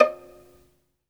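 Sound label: solo violin, strings; ILIO; Synclavier Strings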